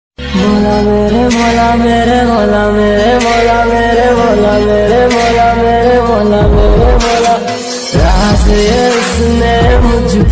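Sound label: romantic instrumental ringtone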